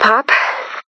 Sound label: Pop; Stonewood